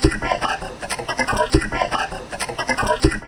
VOCOD, Drum Loops Crazy Processed

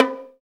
LO BONG, ETHNIC PERC; Roland - LA Composer vol. 1

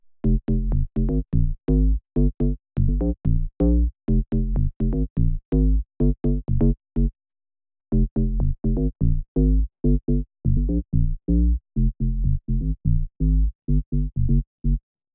125BPM DRIVE BASS F, Bass Loops

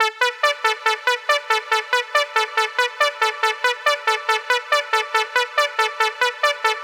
140BPM G; Vengeance - Essential Clubsounds Vol.4

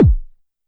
BD Ana Rubber; YAMAHA RM1X

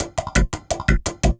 LOOP, Drum & Bass, 170 BPM

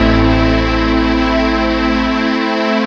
SYN, DANCE SYNTH; Sound & Vision - Gigapack I CD 2 (Roland)

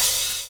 HH OPEN, Drums; USB Soundscan vol.11 - Drums Dance & Groove; AKAI

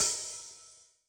Open, HiHat; Yamaha DTXpress-II

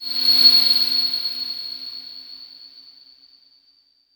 noise fx; Masterbits; Switch FX

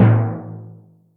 Timp H; Yamaha DTXpress; Percussion

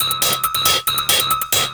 percussion loops 138; PS-45

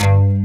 HRD PLCK BS; Pro-Rec - Synthsonic; TRINITY

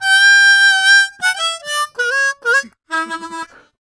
Harm 098bpm A, Harmonica

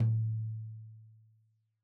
Tom2 Stereo, Deeply Sampled Drums; SMDrum

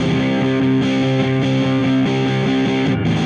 Dirty Chunk Guitar, Indie Rock

